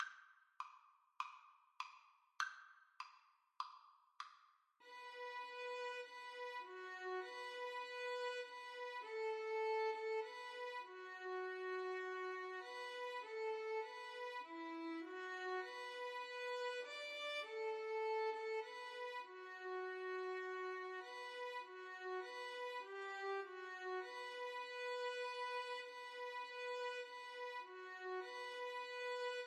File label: carol violin duet